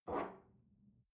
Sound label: espresso grab from table soft